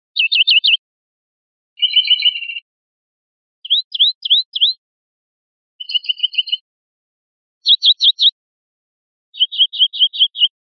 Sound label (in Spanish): chuines, pardillo